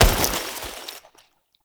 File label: foley weapon fire; Warframe; Characters; Kahl